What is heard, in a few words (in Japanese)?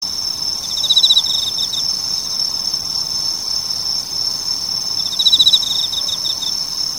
kourogi